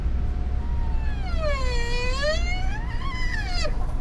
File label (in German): Kommunikation Nashoerner whine; Breitmaulnashörner (Ceratotherium simum simum)